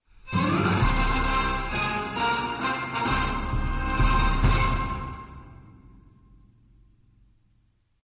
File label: victory music